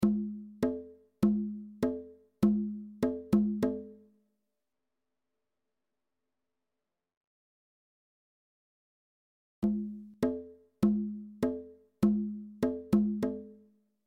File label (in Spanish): bongos; reciclar tocar